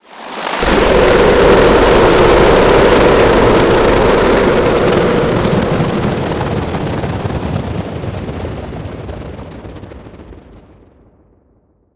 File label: white flash